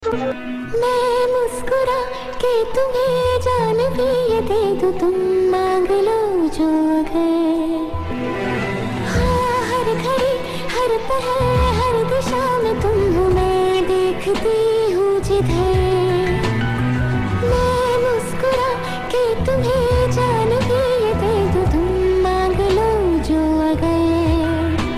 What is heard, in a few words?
Flute Song